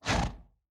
buffalo gasp; CrossCode; battle

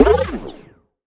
wave sucked

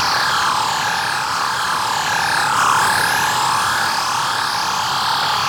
moth laser; temple; battle

